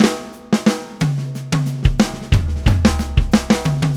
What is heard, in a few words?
Rock Fill 6beats, Drums; ACID MUSIC